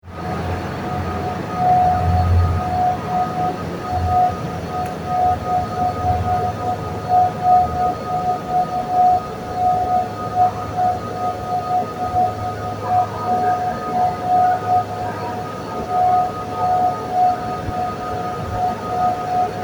electrical outdoor distribution box greece

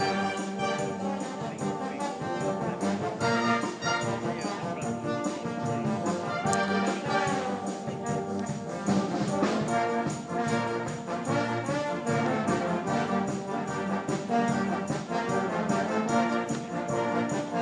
brass; forest of dean

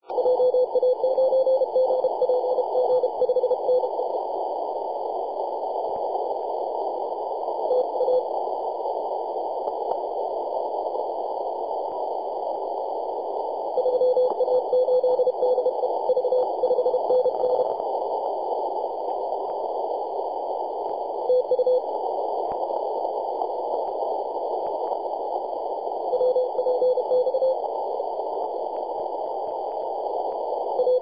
10.105MHz CW

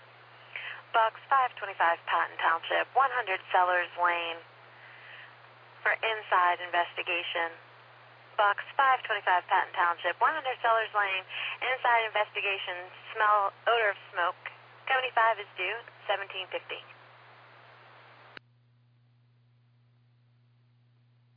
fire company page received

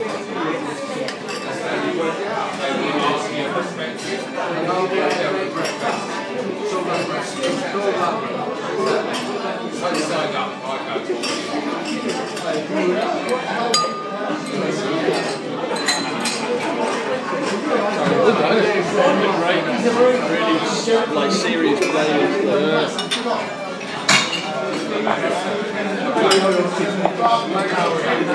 music upstairs dulcimer; Chorlton

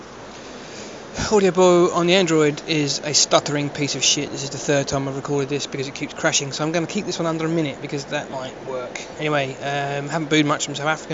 booing; from the western cape

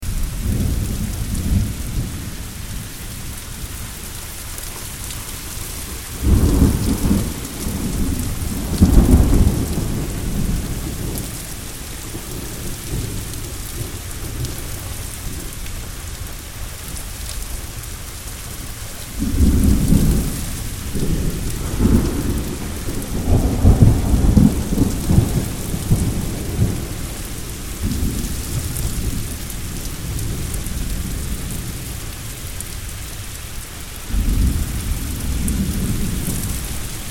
thunderstorm in louisville

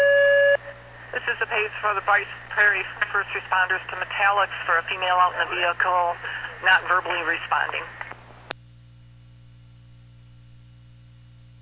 page received; riceprairieems

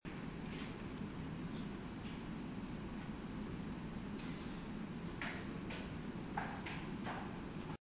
a very quiet office

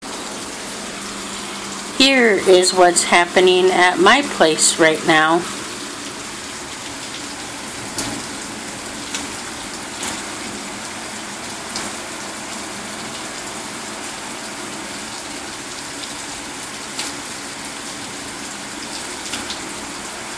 rain and bad storm

